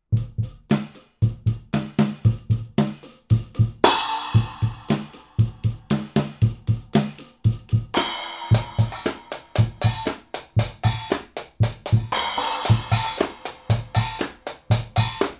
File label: Drums Blumlein, C414